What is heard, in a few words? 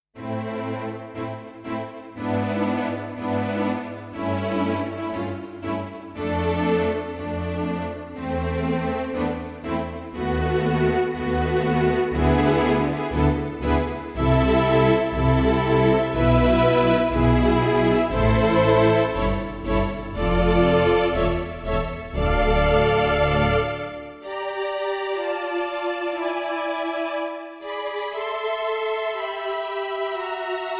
Strings